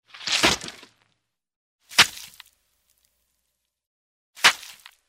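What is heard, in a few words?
blood splatter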